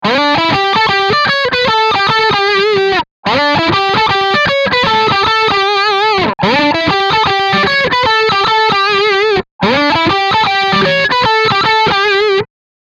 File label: BRIDGE drive, eclipse active, line6